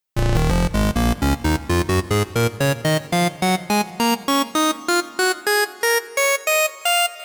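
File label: lead dry basic; the transmitter soundset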